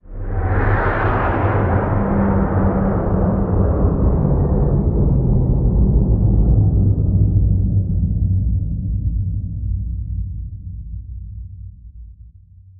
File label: Fly By Broken Bass